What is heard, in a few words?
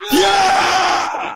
old fart